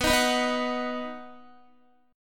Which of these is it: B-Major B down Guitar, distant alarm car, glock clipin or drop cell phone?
B-Major B down Guitar